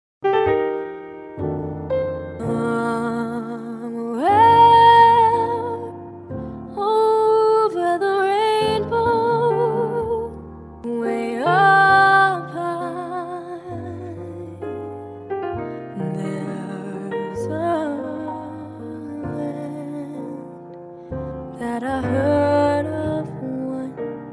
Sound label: Jazz